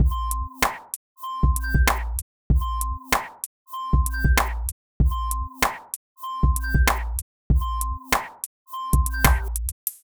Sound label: beat 4 bar